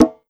Perc Tabla Mid

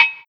Perc Metal

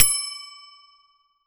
Bell Small Bell; Edobardo

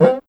Perc

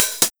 HiHat Foot TR909

